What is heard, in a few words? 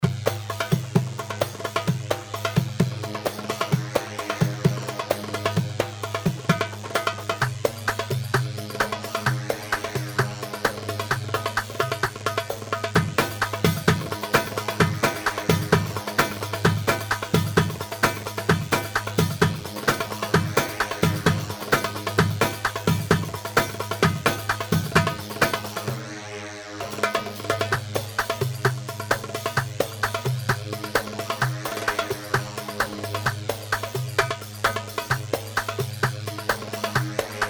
Saiidi 130, Darbakati, Sharqi; صعيدي